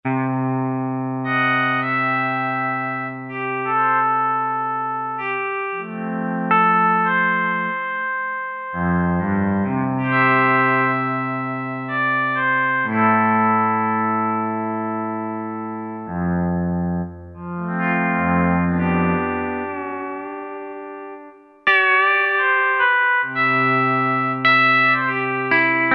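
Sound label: Pedal Steel Vel; Country; Roland SR-JV80-17 Country Collection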